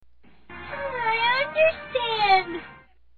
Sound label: Grr